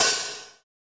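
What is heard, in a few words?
Kawai ACR-20 hi-hat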